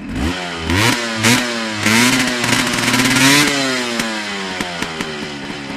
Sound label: accel